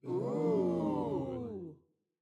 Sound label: audience oo